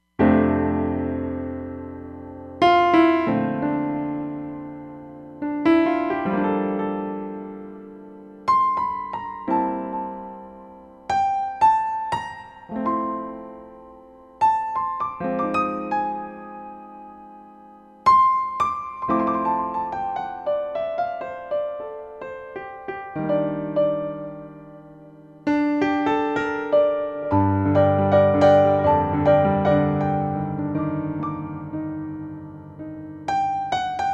X Pure Grand, Roland Fantom XR

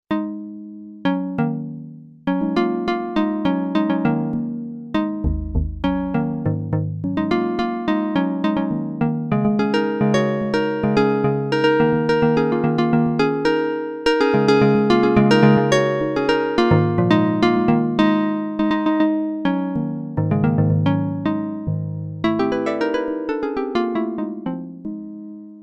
5TH SYNTH, Korg DW-8000; Factory Patches

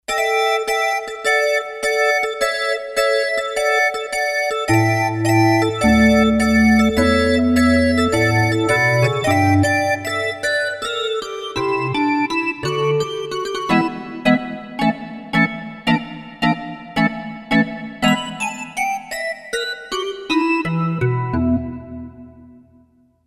Kawai K5000S; Cntmporg

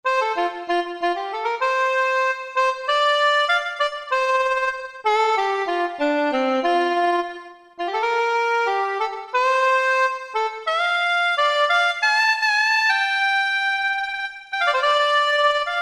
WN Moothie, Yamaha TG77; Factory Presets

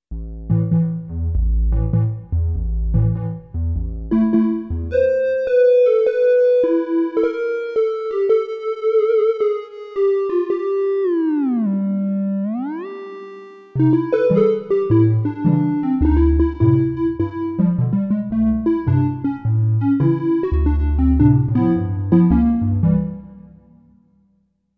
Analog Pipes, Oberheim OB-8

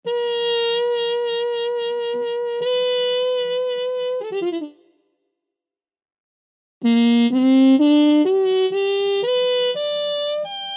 Mute Trumpet; synthesizer; Guess the synthesizer game

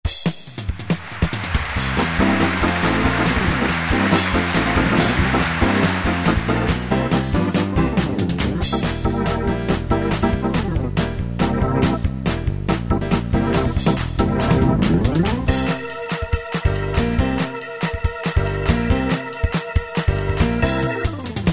Gospel; Preset Styles

